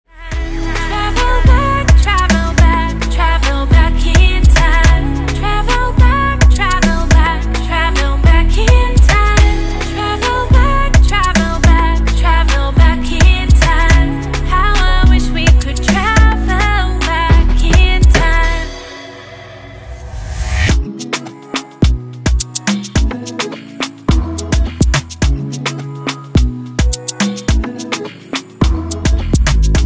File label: Beat Hook